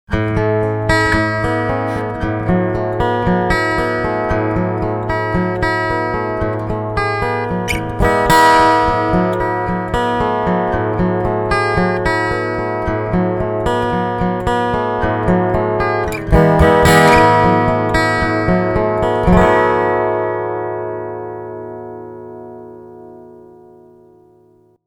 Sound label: soft picking